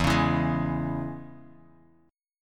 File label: E 6th, down, Guitar, DADGAD